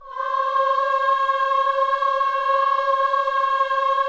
KIDS CHOIR, Gospel; Spectrasonics; Vocal Planet

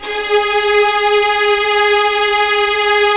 STR Violins, Roland LA Composer; Roland - LA Composer vol. 4

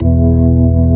ORG VK, B-3 ORGANS Vinta Keys B3

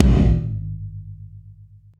Tom, Gated Reverb; musicradar

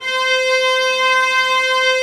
Vla C4; Digital Sound Factory Virtuoso

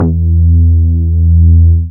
BAS MINI, MOOG; Sound & Vision - Gigapack II CD 1

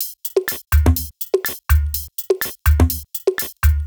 Top D 124bpm, House Drumloops; Zero-G House Fabrik Drumloops

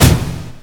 hit, PERC; Techno Trance Essentials

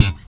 POP G, bass; Spectrasonics; Bass Legends CD 1

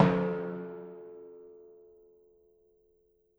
Timpani, Orchestral Percussion One Shots; Sonic Foundry (Sony Creative Software); Orchestral VOL-2

